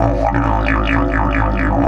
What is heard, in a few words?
130, Didgeridoo